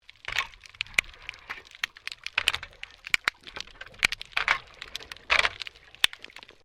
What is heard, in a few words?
MAINTAINENCE WORK UNDERWATER SHOVEL SCRAPING